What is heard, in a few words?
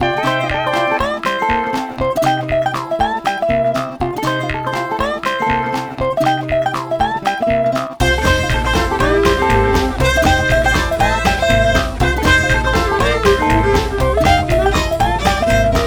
Music Loop